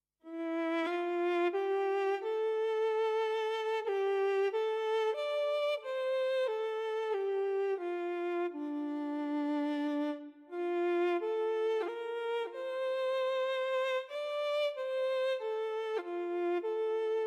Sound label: violin; alondonderryair